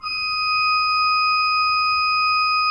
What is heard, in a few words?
Cathedral Organ, D5; Propeller Island; ITAL.PRINZ.R